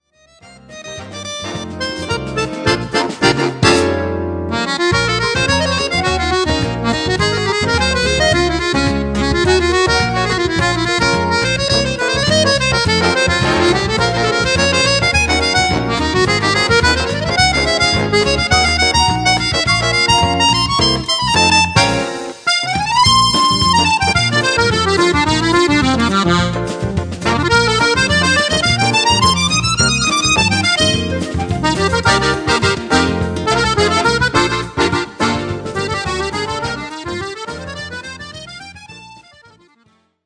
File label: SAMBA